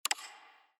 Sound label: click